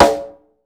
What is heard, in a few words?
SI HI RIM SN, ETHNIC PER; AKAI S-Series CD-ROM Sound Library VOL-5